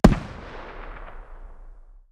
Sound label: hmg dshk medium distance, 12.7x99mm; tacz-tweak-pack; taczmechanics